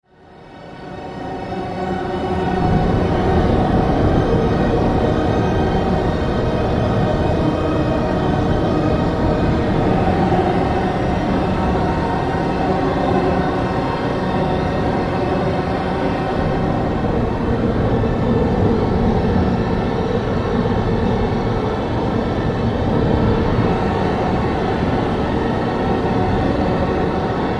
Orchestral Drone

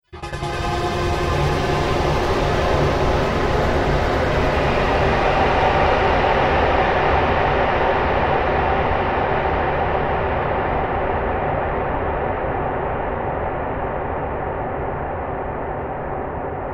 Drone Dark Haze, drone sound effects

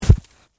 Impact Log Drop, On, Ground, Leaves, Heavy

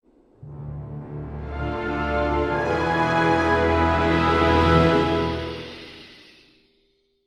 Scifi Audio Logo Ident Epic Climactic Orchestral; Sonic Logo

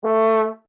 HORN A4 FF SHORT, French Horn in F; Alexander French Horn in F; Horns; Musical

